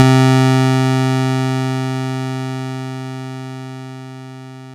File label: RY30 Pulse; Yamaha RY30